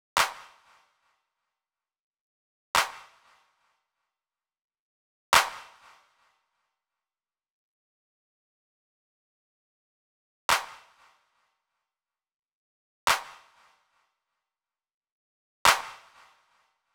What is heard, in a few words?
clap, Drum Tracks, Smooth RnB